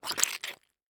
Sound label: Horror Scary Squish Eyeballs Crush